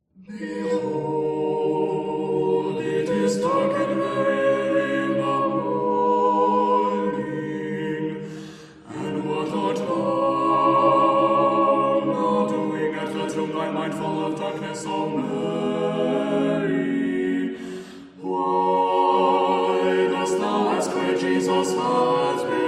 The Seventh Resurrectional Eothinon (Kievan Chant, Tone 7)